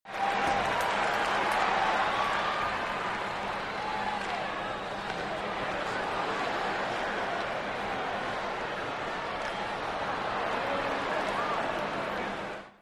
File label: Crowd Hockey Idle, sports crowd hockey